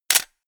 camera

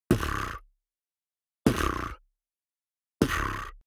beatbox bass drum tongue roll

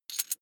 coin big drop regular

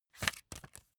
magazine flip forward fast

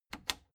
plastic button latch loose click disable fast

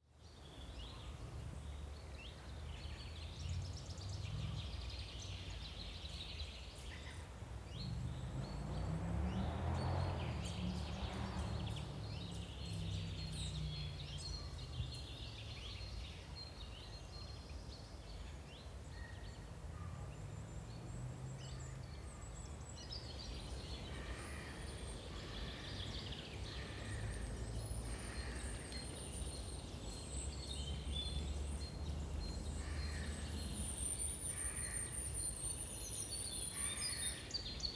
park city birds cars

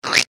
qrrrk

